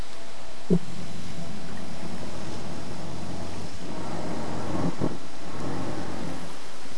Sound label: Breathing, Sea Sounds